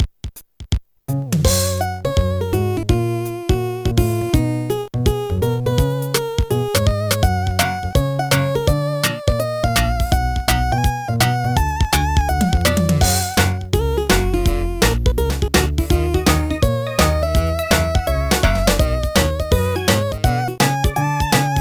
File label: elevator jazz; MED; Apollon